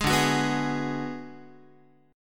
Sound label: D 6th Flat 5th D down Guitar, chord; D6b5 strummed; 11,10,9,9,12,x; tuning 4A,4E,5A,5D,5G#,6C#